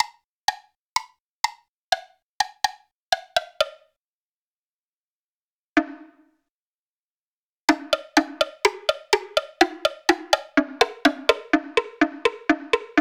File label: woodblock